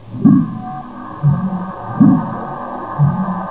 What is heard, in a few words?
weird thrum, ambience